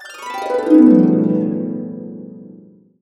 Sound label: B, Harps; USB Soundscan vol.62 - Complete Harps; AKAI